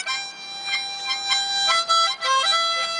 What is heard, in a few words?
Harmonica